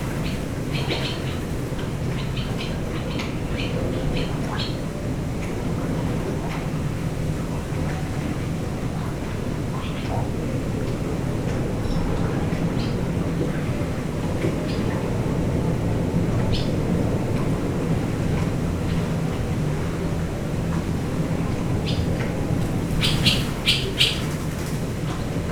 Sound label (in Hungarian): madármegfigyelő házban kisfecskék, partifecske; Hortobágyi Nemzeti Park; halastavak